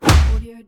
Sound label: player punch